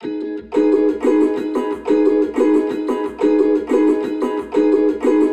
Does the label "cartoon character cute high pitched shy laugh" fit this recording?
No